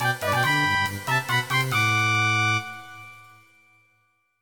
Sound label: Jingle; Pokemon Opalo; Pokemon Essentials